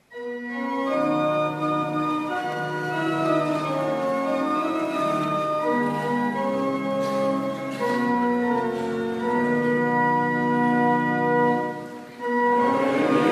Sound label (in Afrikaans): Gemeentesang